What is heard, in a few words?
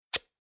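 Fabric Tape Cloth Tear Rip Single Fast; Ripping; Objects; Tom Hutchings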